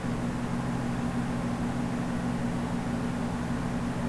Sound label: fastline station, ambience